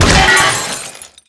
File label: robo impact hard; tf2classic; mvm